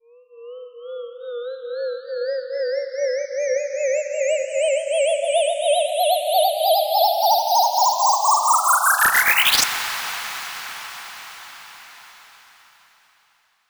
Uplifter

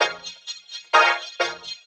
Vocoder Loops 128BPM

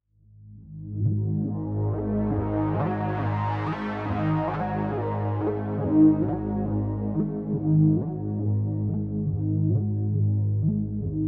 Atmopad 138BPM, G#minor; Unlooped; Vengeance Samples; Manhattan Kit

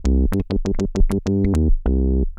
Roland F Line, Roland S100

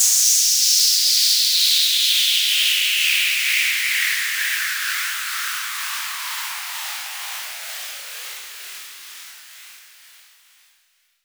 Downsweeps

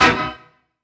Synths B; vengeance essential club sounds-3

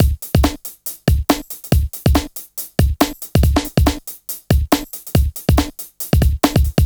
Breakbeat 140 BPM

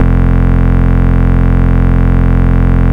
Root F, Bass

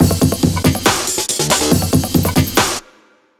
Drumloop; Ghosthack

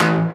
Synth Sound; Vengeance Samples; VEE Synth Shots

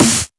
snares; Vengeance Samples

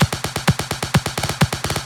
Fill Ins 128BPM; Vengeance Samples

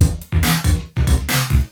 Loops BB 140, BreakBeats; Vengeance Samples

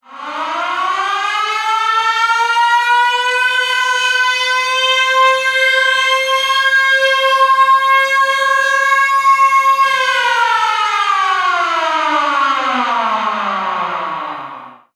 FX Alarm; Vengeance Samples

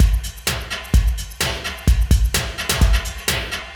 VFX2 Loop 128 BPM

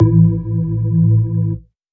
Synth Cuts; Vengeance Samples; VES1 Instruments

